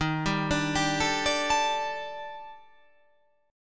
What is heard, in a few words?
D sharp Major 7th Flat 5th, down, chord